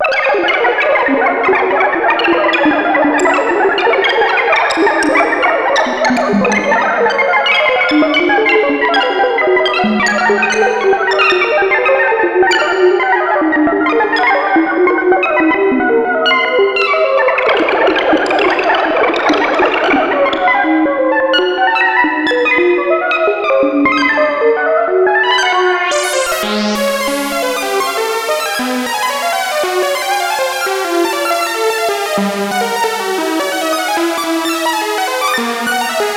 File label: S-H FAST, SYNTH; Keith Emerson Signature Series